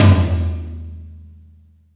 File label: ambient tom